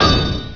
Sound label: hit wall, weapons sword; chaos